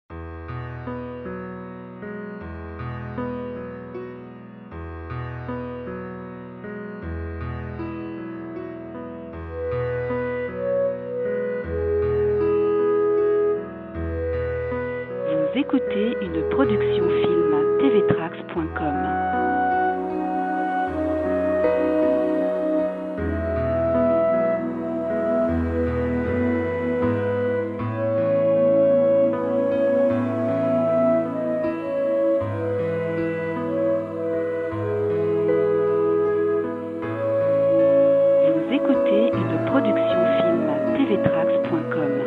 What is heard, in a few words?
Douce nuit Noel